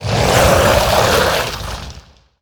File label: Walking Fountain Attack